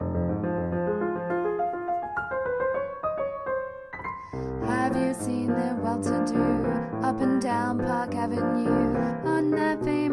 tenor

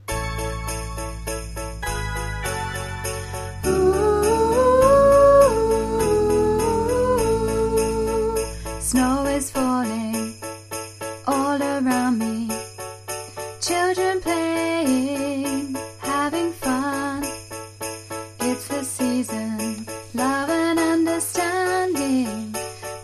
alto middle part

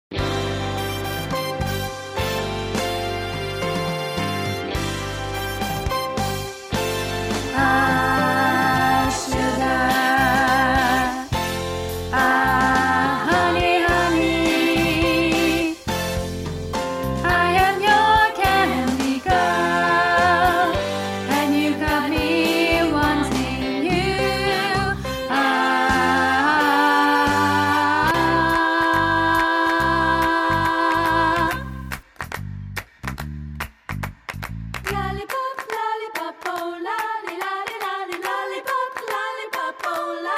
tenor

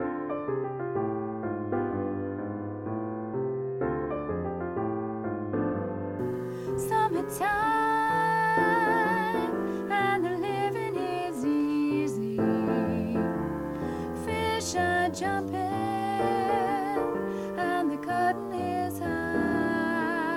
alto

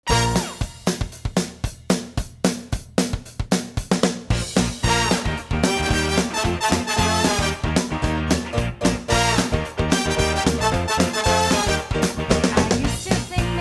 tenor, choir

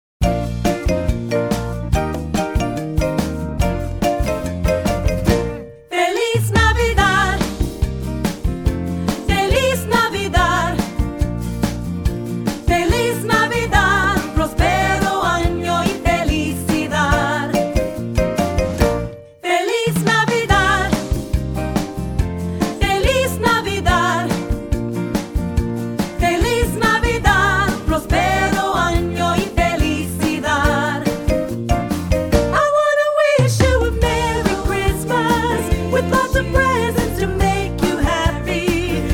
Soprano, choir

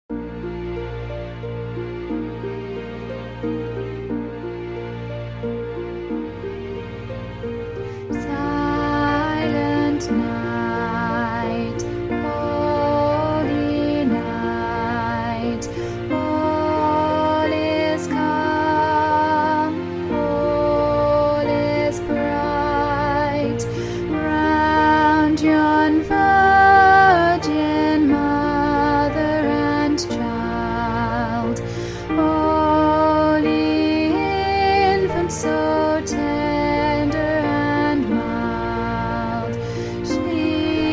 Silent Night alto middle part, choir

